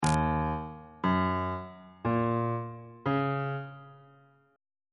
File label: f phrygian 7th chord 7 is e flat minor 7th on bass clef